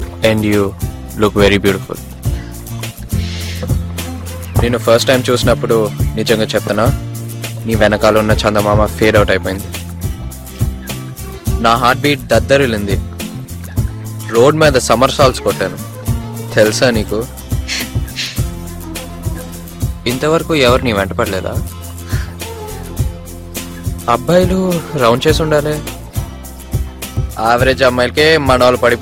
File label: black beat, hip hop